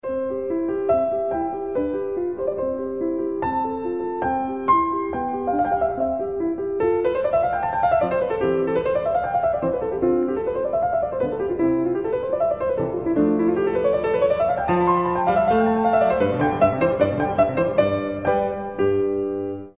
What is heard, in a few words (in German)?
Klavier Sonate; in C; Mozart; KV 545; 1 Satz